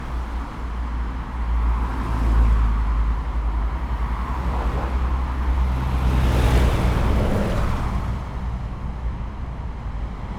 urban traffic, tyne bridge, newcastle, uk, morning; Glitchedtones